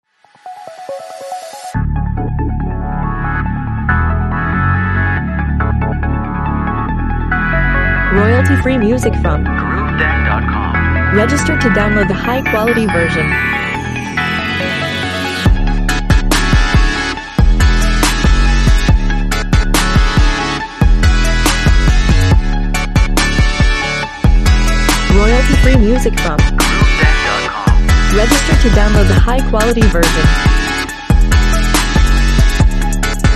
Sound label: Jump And Fly, Bright and powerful synthesizer sets the strong energy of this composition